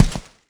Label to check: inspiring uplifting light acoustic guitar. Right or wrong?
Wrong